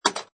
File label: Pinball Sound Clank With Recoil; Video Games (Sound Effects:Games)